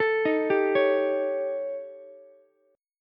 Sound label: D sharp 7th Flat 5th, D sharp, down, Ukulele; arpeggiated; JGuitar